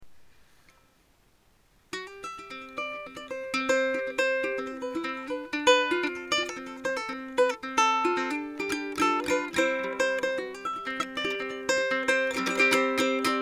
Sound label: without pre, ukulele